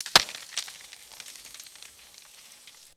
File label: dry stick snapping and tumbling through foliage; vegetation